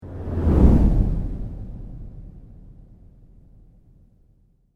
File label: Sweep Slow; Created sweeps, swooshes and other movement effects; Sweepers; Sound Design; Foundation4